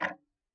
Tick, Clocks Kitchen Clock

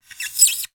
Polystyrene Squeak

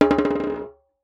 Jembe Bounce; Percussion Samples

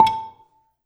Gambang Soft Beater, Gamelan

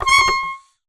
Ornament, Melodica

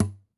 Classical Guitar Plink Chunks; Classical Guitar Extended Technique Stereo

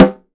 Snare Drum; Percussion Samples

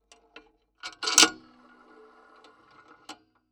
PayPhone

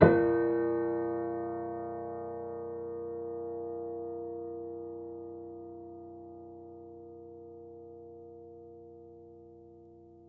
Piano Harmonics, Harm Low Mid

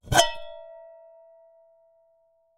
Strike, Vase

